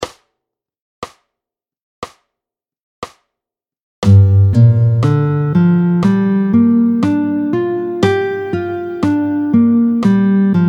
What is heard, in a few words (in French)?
Arpège mineur 7 Solm Dom, tempo 60; arpèges au médiator; Les accords mineurs 7 Solm7 Dom7; Arpèges de Sol mineur7 et Do mineur7 tempo 60; Exercice chapitre 8